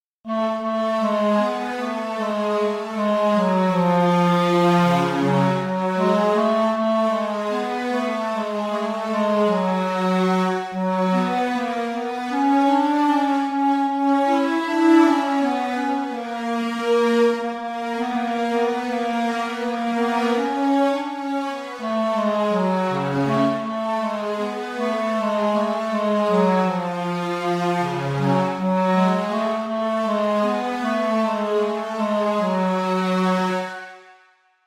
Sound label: Organ; Psalm11